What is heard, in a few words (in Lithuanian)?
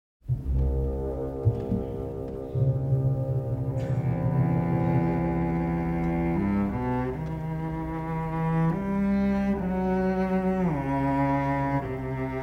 Koncertas violincelei; Domarkas; Paketuras